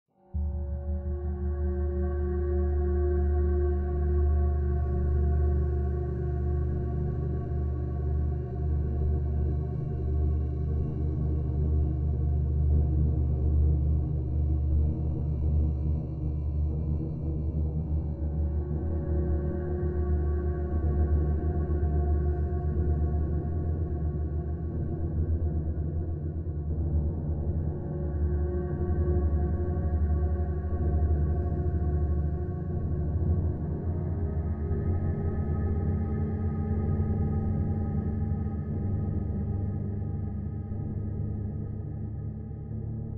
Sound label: Suspence Pad, Low Intensity; apex legends season 12